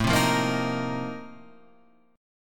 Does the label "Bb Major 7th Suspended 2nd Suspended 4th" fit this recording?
Yes